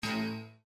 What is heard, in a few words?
Windows Critical Stop